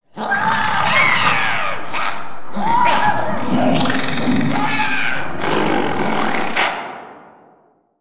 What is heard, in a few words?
Monster Crowd